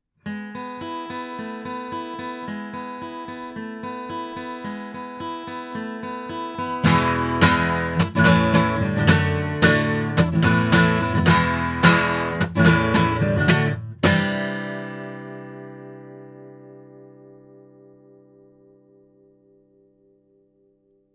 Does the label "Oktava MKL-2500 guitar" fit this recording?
Yes